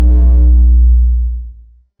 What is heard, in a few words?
error buzz thud